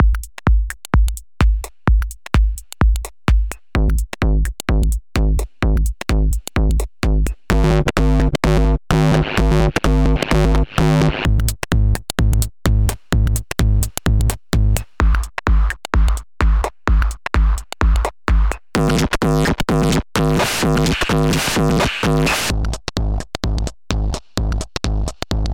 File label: Drum Loop; Kombinat Presets